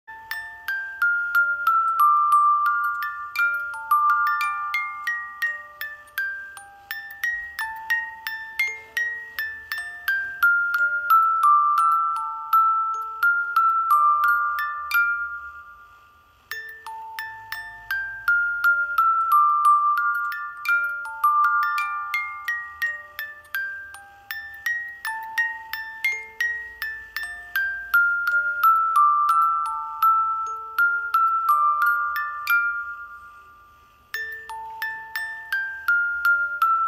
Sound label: Romance de amor Music Box